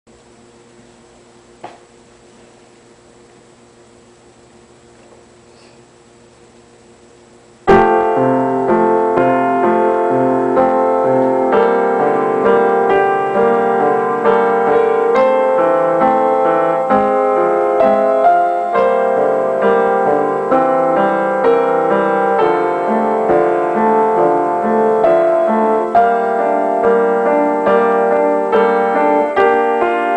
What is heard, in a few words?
piano; cardcaptor sakura